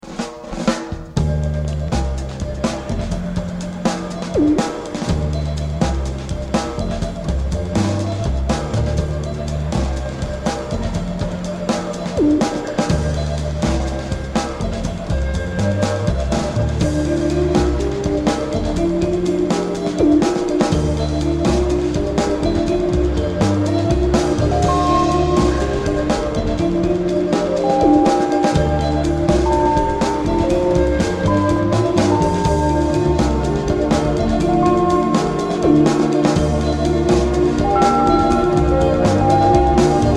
vibration ambient

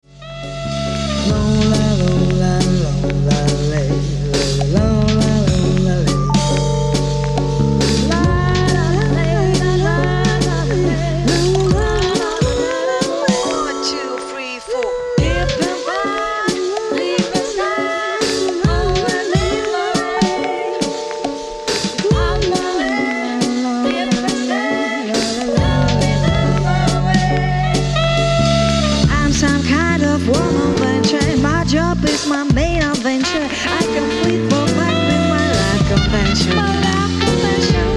PIANO

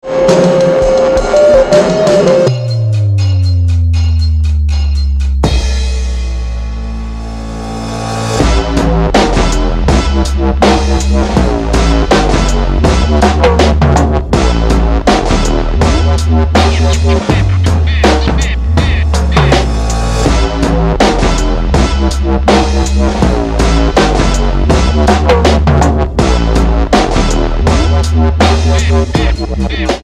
jelly bass loop

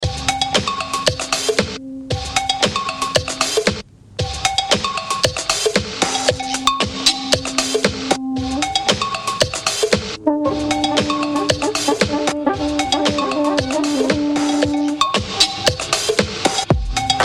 loop

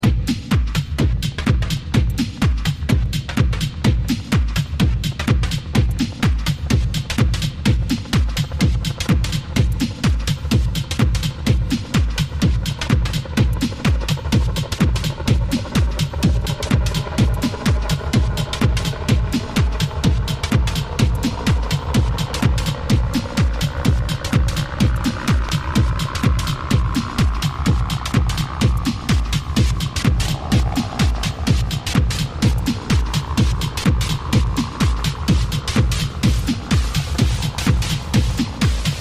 Tick Tock

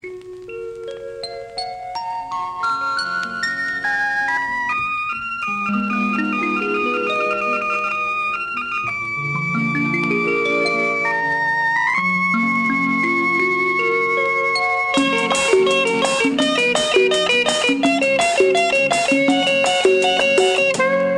saxophone

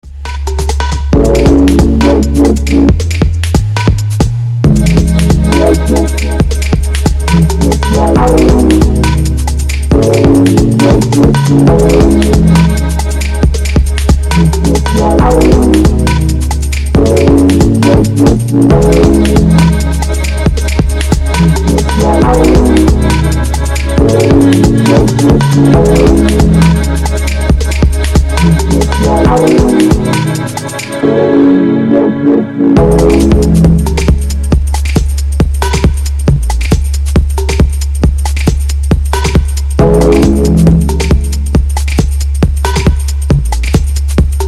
cloud strings

